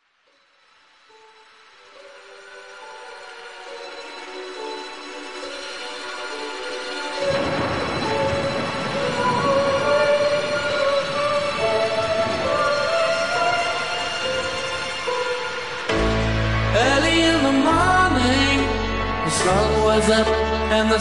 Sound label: Rain Is Falling